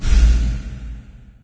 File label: hole hit, ambient atmosphere; Left 4 Dead; Source Engine; video game